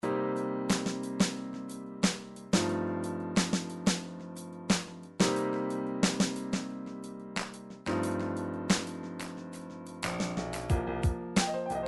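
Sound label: triphop arpeggio, midi; Newtronic Triphop Construction Kit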